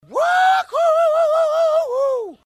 wooohoooouuuu

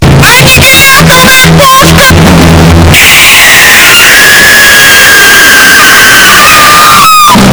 loud scream boy

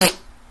fart; slim jim

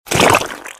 goosh

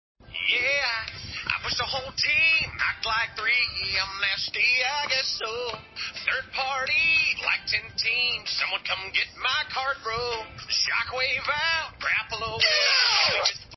remix; funny fortnite

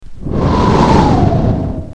mortar incoming

